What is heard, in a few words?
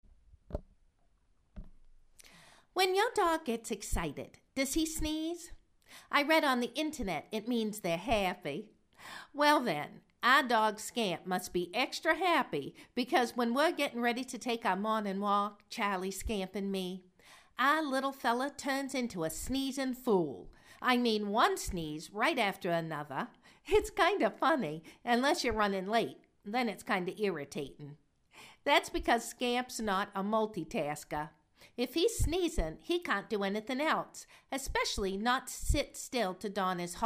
Sneezing